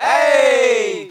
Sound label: ay, chorus; Voice; ooh ahh